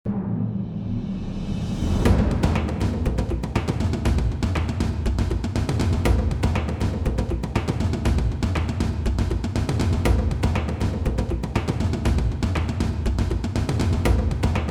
Epic Drums

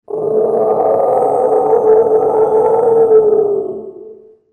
Monster Growl; Soundzabound Volume 3 Disc 3

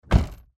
Car Door Shut

